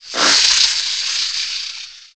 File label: attack